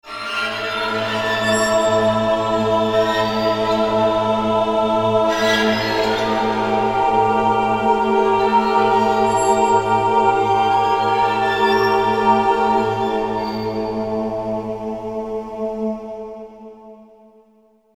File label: Orchestral Phrase; FXHome